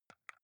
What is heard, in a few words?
console controller xbox arrow key press